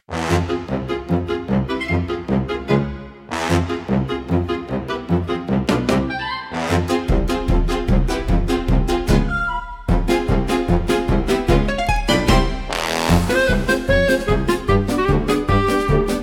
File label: Tuba Tumble Chase, comical orchestra for slapstick gag scene